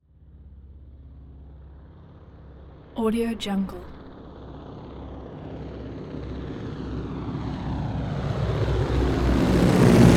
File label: transportation prop plane ju52 fly by overhead from right